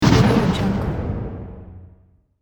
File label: shot